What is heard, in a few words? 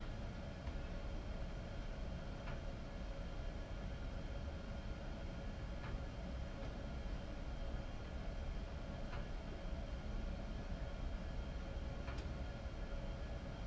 Amb Office